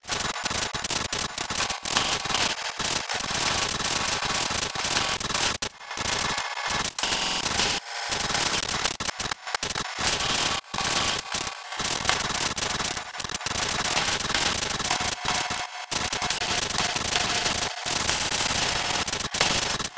glitch noise

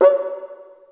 Cuica, Yamaha SY22

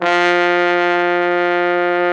TROMBONE; Best Service Gigapack 1

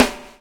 BUBBLE; Spectrasonics - Backbeat

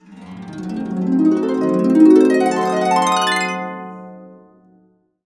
C DIM7 CA UP, CASCADE UP, Complete Harps; USB Soundscan vol.62; AKAI

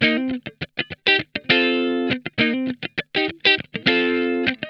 STUCK UP, Funk Guitar; Zero G - Funk Guitar

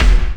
TURBO KICK

